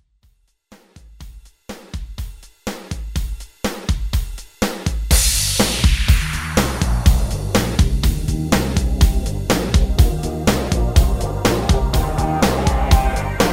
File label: 80s Rock Redrum